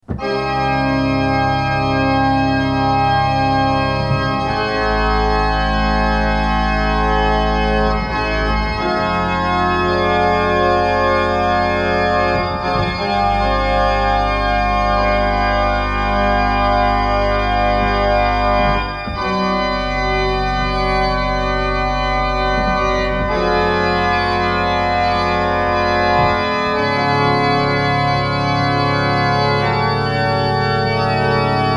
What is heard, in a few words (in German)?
Orgel Improvisation